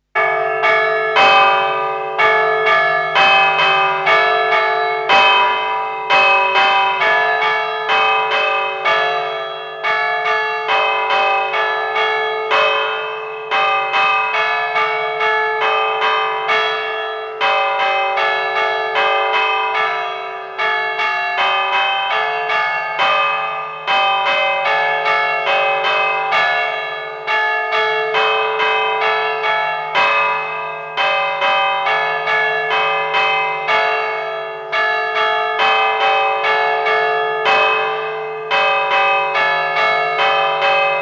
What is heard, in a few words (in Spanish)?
tente nube, campanas